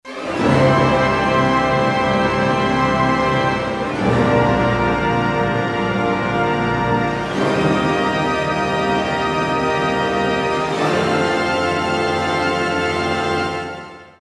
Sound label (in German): Orchester Auftakt Jingle; Jingles for films podcasts